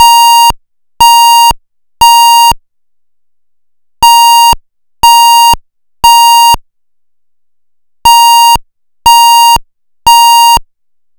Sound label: Interrupted ISO8201